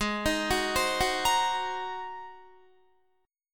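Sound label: A flat 9th A flat Guitar, chord; Ab9 arpeggiated; 16,18,16,17,18,17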